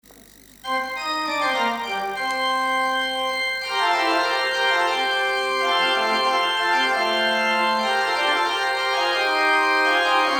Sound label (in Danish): orgel; Denne er dagen